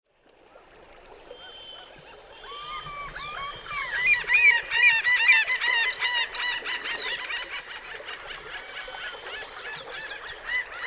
common gull

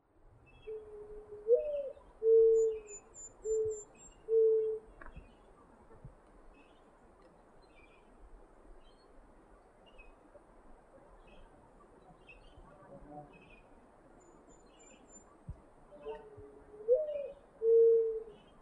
mourning dove

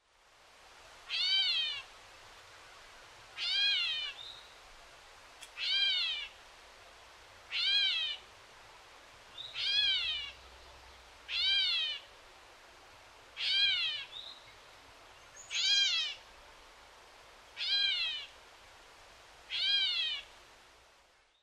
gray catbird call

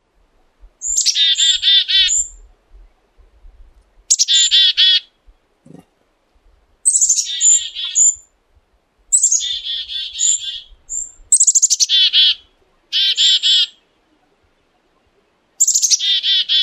black capped chickadee

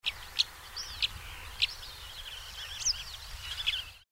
greater short toed lark

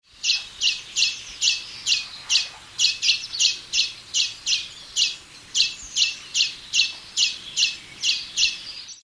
linnet

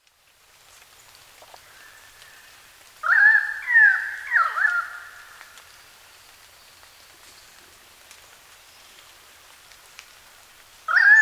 pied currawong

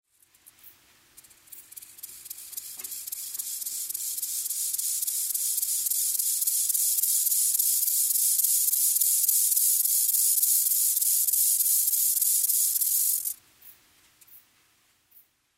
rufous grasshopper

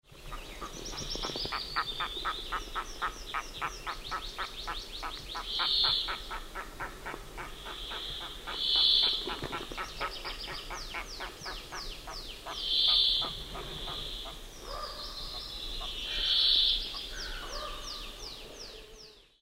bean goose

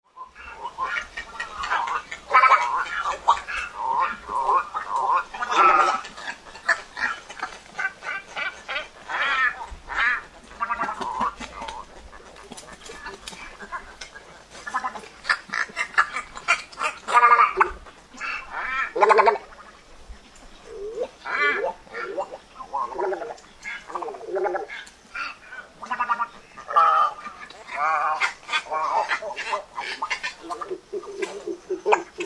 little egret

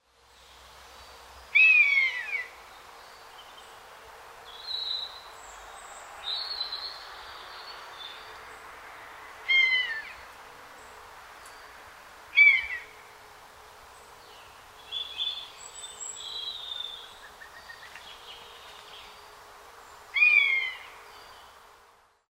buzzard

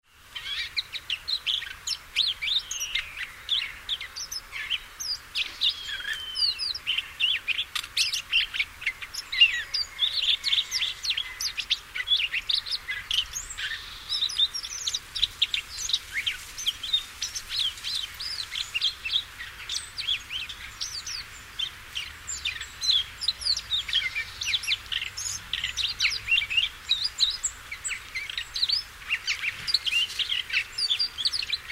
siberian rubythroat

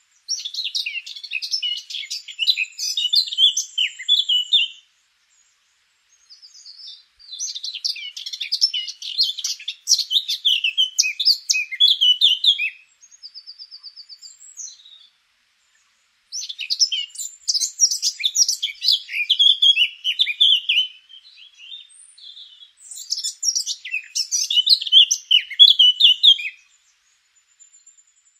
blackcap

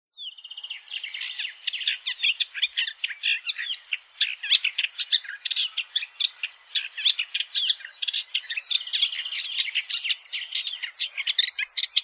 masked shrike

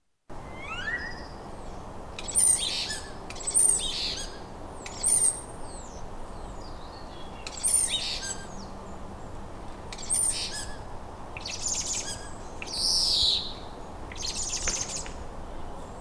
Starling

